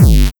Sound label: Kick Dist Thumpy Granular; Granular Sumo